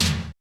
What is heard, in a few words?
TOM, SY99 KIT; Crypton - DrumBase5000